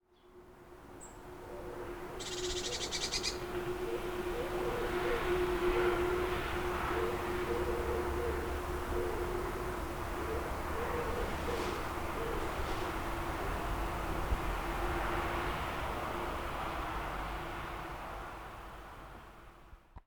Zoom Q2n 4K Beispiel Atmo

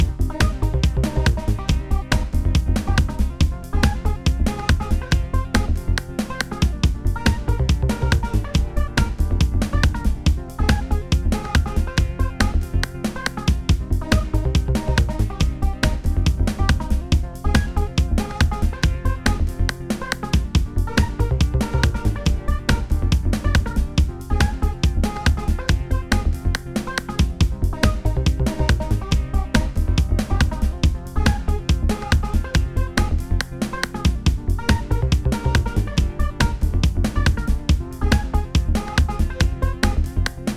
Solo mit Snare mit Harmonics

